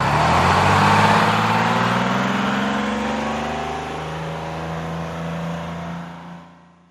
Bus Awy Truncated, Bus Idle, Very Close And Airy. Could Play For Generator O